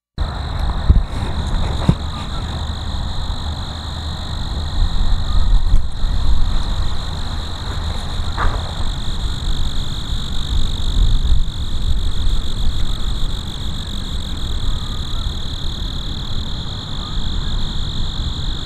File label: Eunemobius carolinus, singing insects